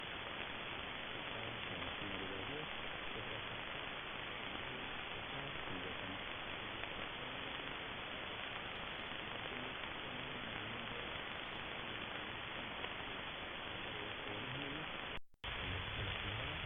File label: 15090khz, AMS; Kuwait; Radio Liberty